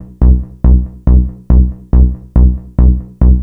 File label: NRG Bass, Trance